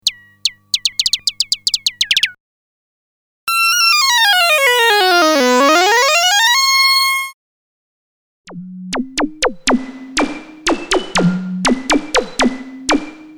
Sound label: Moog Modular